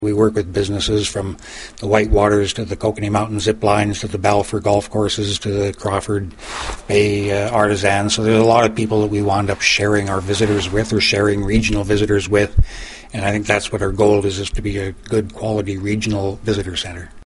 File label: Tom